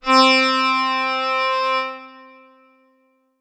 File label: Ensoniq ZR-76 B Psaltery C4